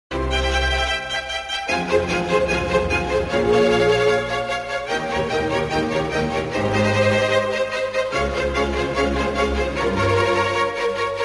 classical ringtone; vivaldi

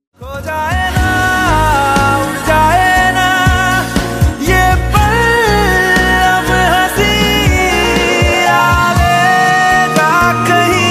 bengali ringtone

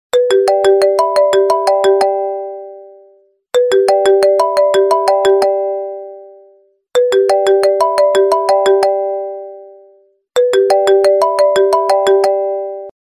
apple ring ringtone

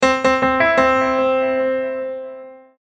piano ringtone

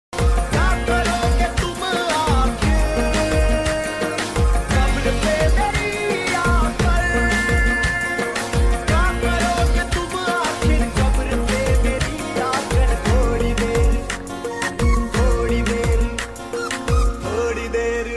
sad ringtone

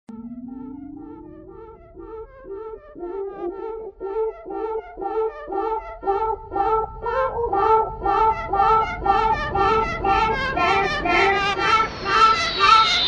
huawei p20 lite ringtone

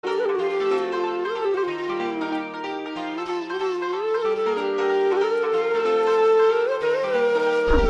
sad srilankan sad tone ringtone

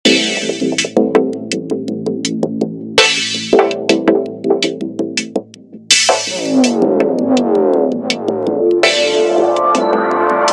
neuro logic ringtone

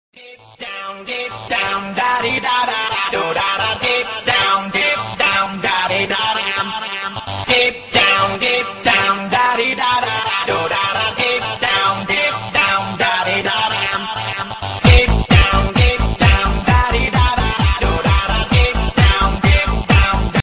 hiphop ringtone

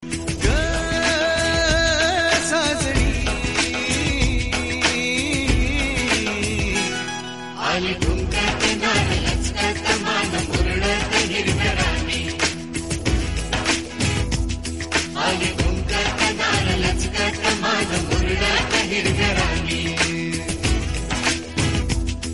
marathi remix tune ringtone

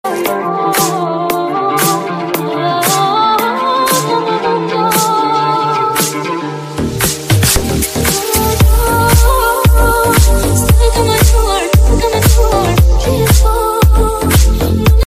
amazing iphone ringtone

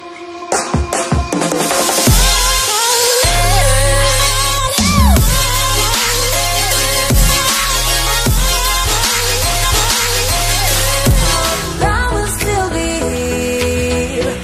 trap ringtone

